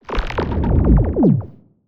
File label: Sci-fi Transition